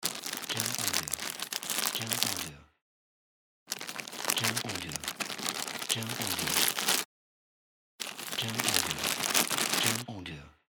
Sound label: Cellophane Unpacking